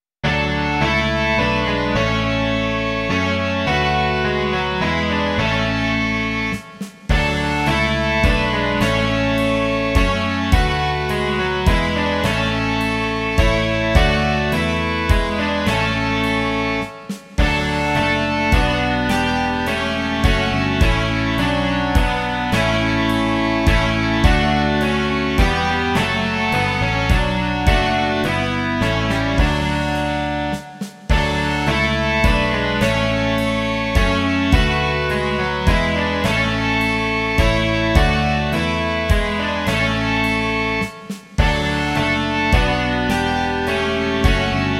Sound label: rock